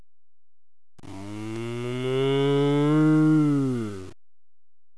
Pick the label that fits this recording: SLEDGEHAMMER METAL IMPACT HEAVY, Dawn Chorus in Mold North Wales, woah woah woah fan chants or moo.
moo